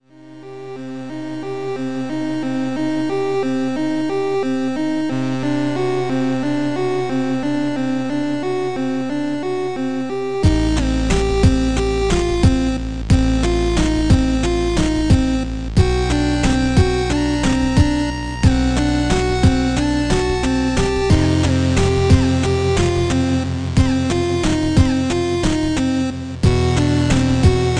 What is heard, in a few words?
Loop; breakout defense; 3ds wii u